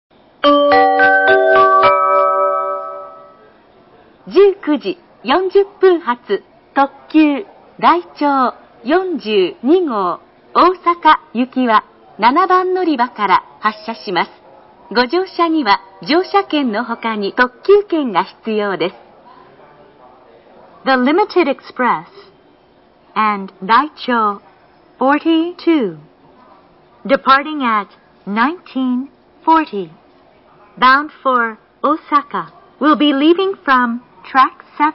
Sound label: kyoto track7 raicho42go; nishinihon